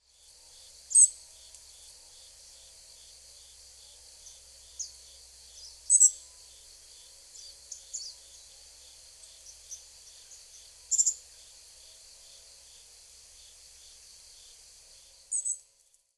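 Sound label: Grey-streaked Flycatcher; hyogo; koe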